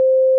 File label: win; Huff-Style